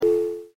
dial tone

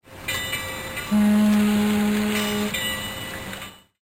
harbor

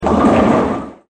bowling

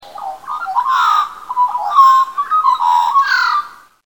magpie

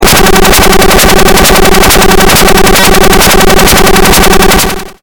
Helicopter

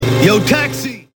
taxi

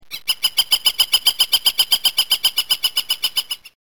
flicker

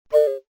flute lo

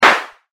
RIFLE

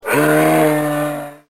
moose

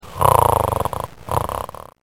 snore

